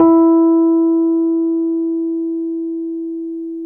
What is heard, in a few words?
RHODES EP2 E3; Sonic Reality - Vintage Timetraveler